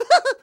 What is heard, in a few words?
tail fire vocal; Scrat; Ice Age: Scrat's Nutty Adventure